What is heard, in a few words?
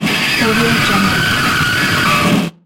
distorted scream